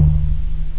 ORGAN; da capo vol1; instruments; synth; chords

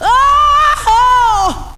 AHOOOUA, VOCALS; Groovy Bytes: Behind the Moon

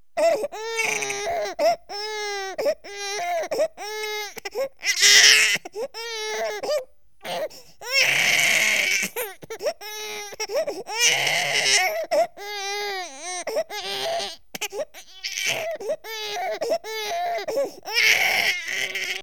baby cry; soundmon

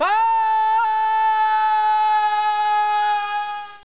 scream; The Ultimate Guide to Mortal Kombat

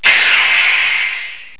shwoosh; The Ultimate Guide to Mortal Kombat

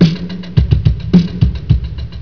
drum pat

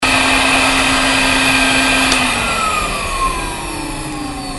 vacuum; machine; motor

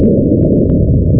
break; asteroids2

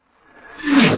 Slam; ImpGal2; groundwar

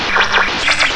cr tuning

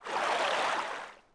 tread; DAoC; Toa; Gamestar

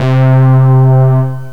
polysynth, synth pad

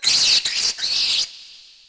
RAT; UrbanChaos

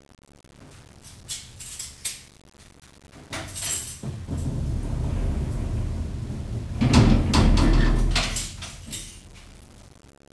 cell, police; disaster

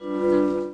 menu popup

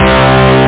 hard guit; guitarras

